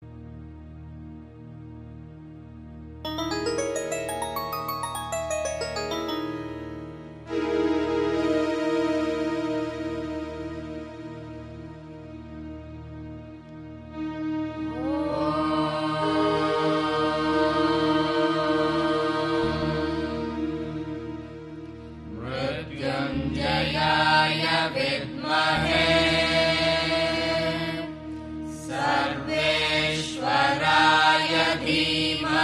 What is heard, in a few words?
Mrytunjaya Gayatri; Shiva